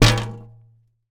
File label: wall glass cabinet; Golden Crayon Sounds